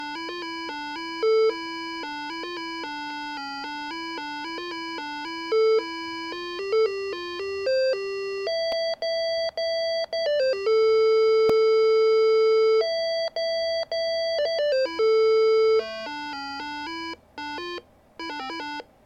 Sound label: speaker, PC Speaker; Спикер. устанавливаемый на все PC для диагностич; soundcard test; Arkanoid; Soundcard test page